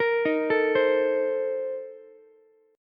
A sharp Major 7th Suspended 2nd Suspended 4th, Ukulele; arpeggiated; JGuitar